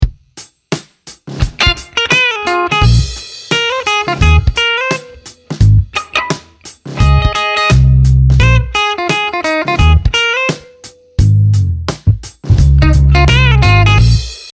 guitar wah

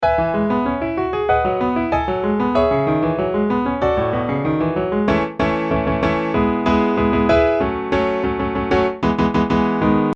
piano compare sampletank3

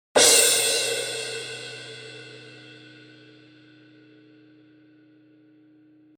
cymbal raw

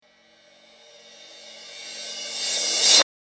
cymbal rev

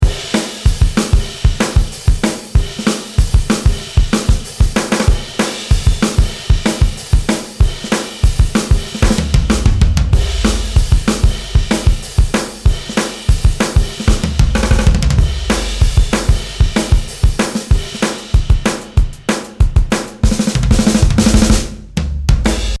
drum source

